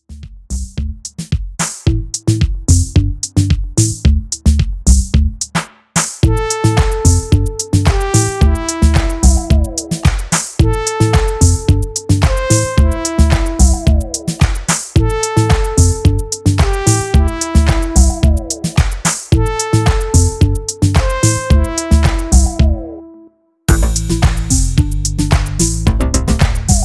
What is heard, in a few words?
INSTRUMENTAL; afro-jazz